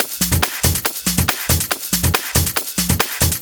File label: Loop 140 BPM; vengeance essential club sounds-3